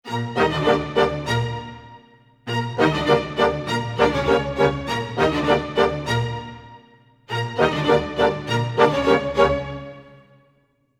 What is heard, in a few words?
g min full mix, Orchestral arrangements; PowerFX - Downtown Orchestra; 100 Gmin